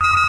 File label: inside your computer asterisk; windows 9x; sound schemes